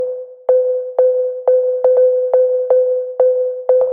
122 BPM Disco; mmb studio melodic

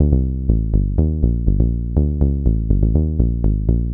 122 BPM Bass Synth A maj G min Tonic A; Pro Bass Synth Studio Kit; MMB

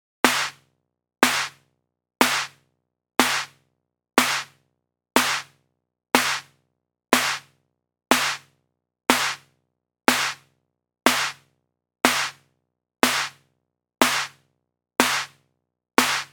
122 BPM Snare, drum; mmb drum arsenal vol 1

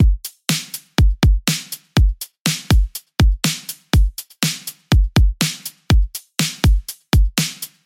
Battery Full Drum, 122 BPM; full drum kit; Forge

